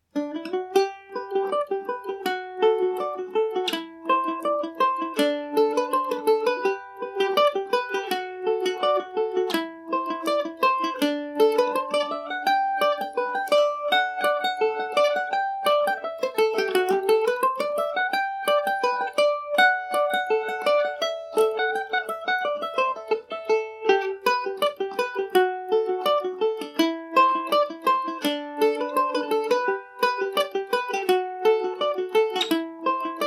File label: parakeet revisited gmaj, mandolin; The Parakeet; G&O #34 mandolin